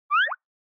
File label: Anime Motion Low